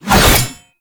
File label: Bayonet Melee Combo; Warframe; Tenno